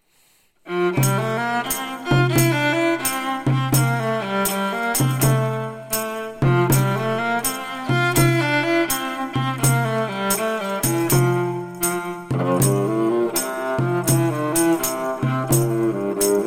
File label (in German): Tenor Violine; Troubadourlied